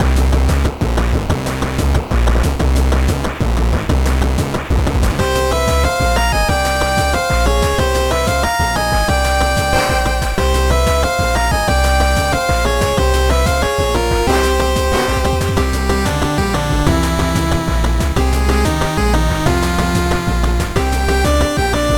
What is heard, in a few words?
8Bit Battle Lake Trio loop